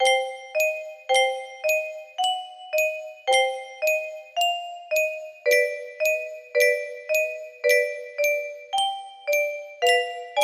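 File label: music box melody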